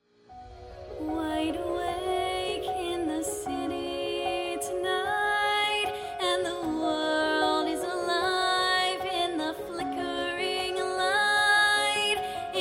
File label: singing; Singing Showreel